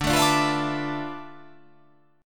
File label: D Minor 6th Add 9th D